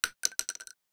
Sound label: cart sg